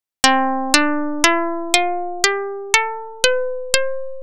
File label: guitar C scale